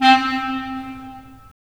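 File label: wind clarinet; Roland - LA Composer vol. 3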